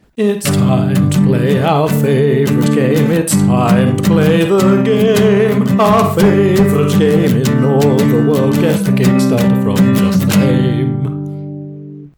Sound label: Jingle with backing